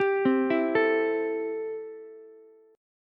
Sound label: A-Minor 7th A Ukulele; 12,12,12,12; Am7 arpeggiated; JGuitar